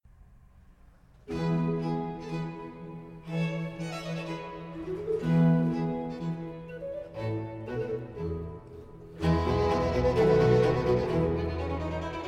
F major for 2 violins and basso continuo and organ obbligato Allegro con spirito